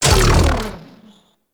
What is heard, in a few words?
Mech Stop; Warframe; Vehicles; NechroMech